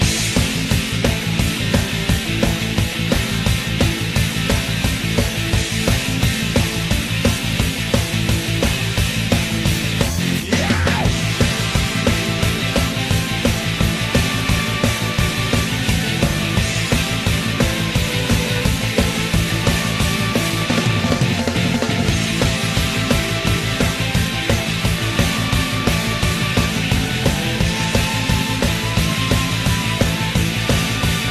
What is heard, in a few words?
BGM Escape; Syobon Action Nostalg